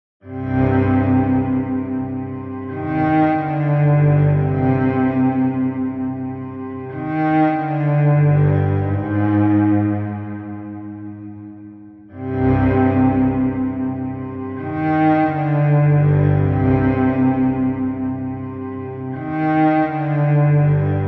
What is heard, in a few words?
CELLO